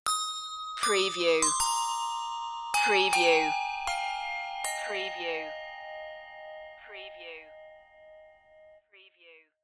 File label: handbells; joy to the world